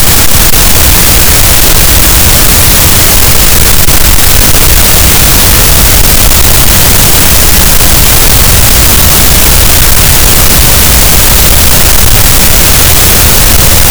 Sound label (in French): cachalot, Physeter Macrocephalus, gaussian noise